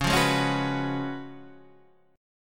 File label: C sharp 11th C sharp; strummed; C#11; 9,8,6,6,7,7; JGuitar